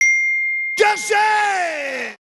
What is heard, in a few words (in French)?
sonnerie gorgee